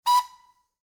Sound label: train horn old